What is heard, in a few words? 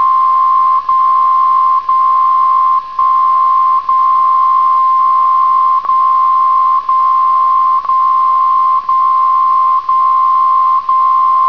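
dcf77 whole minute, funkuhr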